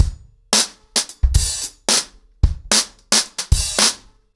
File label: 110BPM, ACOUSTIC DRUMS; The Roots Drumloops